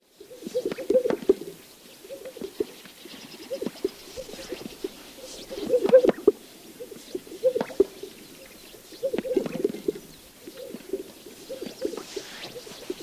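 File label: sounds at lek, greater sage-grouse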